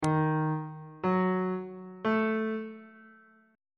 e harmonic minor chord 7 is d sharp diminished on bass clef